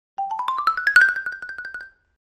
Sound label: Xylophone Accent Shr